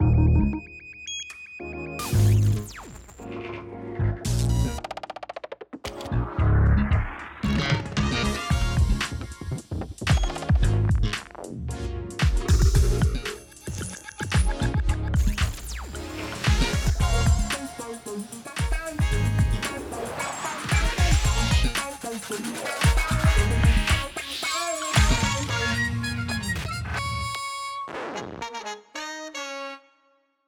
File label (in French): Jingl Fete de la radio spot; 30sec Mixé non masterisé